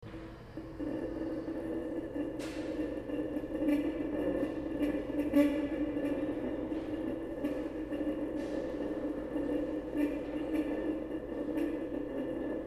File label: blast